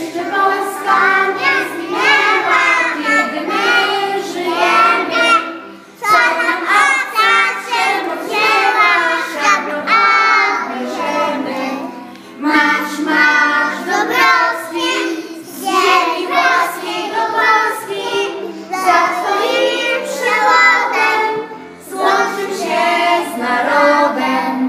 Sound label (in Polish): Tygryski śpiewają Hymn